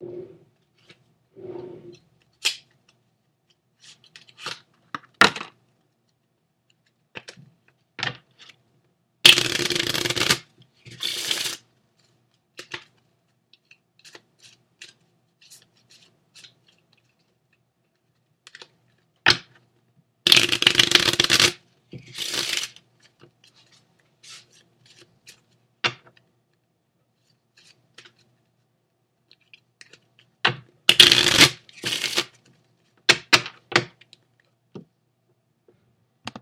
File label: Card shuffle; my friend's room in Vander Poel